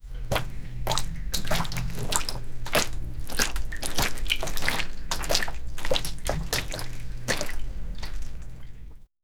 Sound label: man walking in water, wading